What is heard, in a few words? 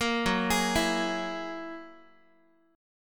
F sharp 9th F sharp Guitar; F#9 arpeggiated